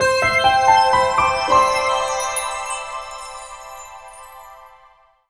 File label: Stage Door Unlocked; Nintendo - Kirby's Epic Yarn; Video Game OSTs